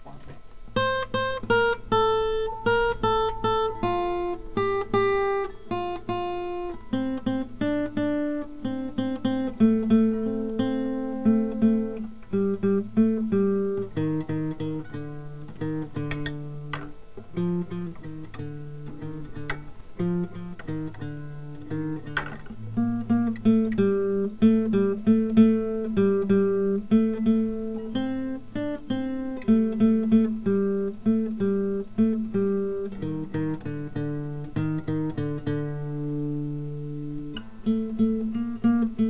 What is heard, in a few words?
SOLO